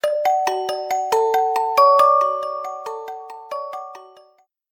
Bell Music, ringtones; ZTE Axon M

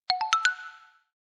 Climb, notifications; ZTE Axon 20 5G

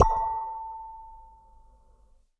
High Temperature Alarm; Meizu; 18 Pro; ui

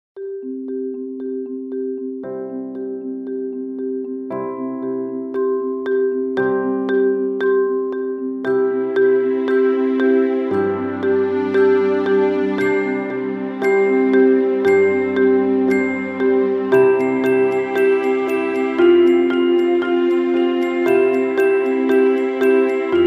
Rise Slowly, alarms

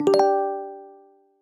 Warm, notifications; BlackBerry Priv

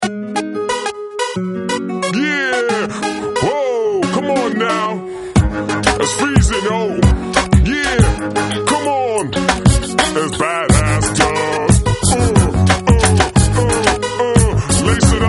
Hip hop; Samsung GT-B5310R

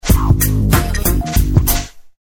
Message tone

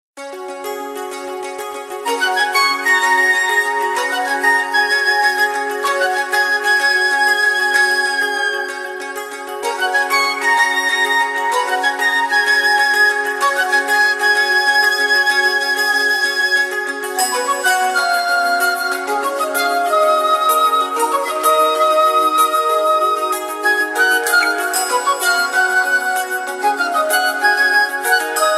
Flutes, ringtones; ZTE Blade L5 Plus L0510

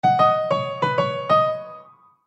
Piano; Aio Wireless

